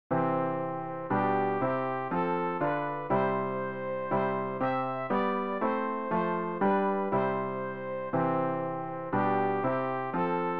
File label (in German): sopran; Ref. Gesangbuch; RG 656: Ist Gott für mich